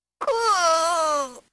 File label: charging; RedDot